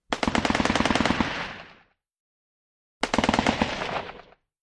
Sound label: AK47 Bursts Dual